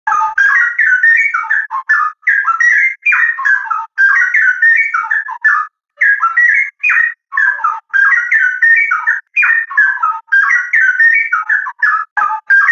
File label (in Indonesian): Suara Cucak Rowo Gacor; Juara Durasi Panjang